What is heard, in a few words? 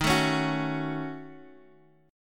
Eb Minor 7th Flat 5th, Guitar Half Step Down; Ebm7b5